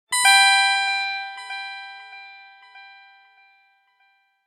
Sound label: there you go, message tones